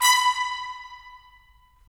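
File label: brass trumpets staccato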